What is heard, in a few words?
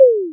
Syn Dr, Overloaded Synthetic Drums; style kits